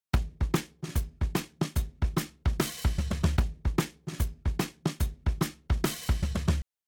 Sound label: Drums Shuffle SN HH; WDR2